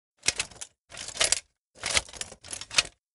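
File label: rocket launcher reload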